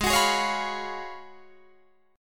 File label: Ab Minor 13th, Ab, Guitar, Drop D